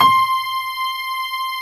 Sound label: PF C 5 -L, GRAND PF